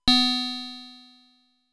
Service Switch Sound; Warp Warp

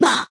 Pharaoh Mouth open; Cut the Rope: Time Travel

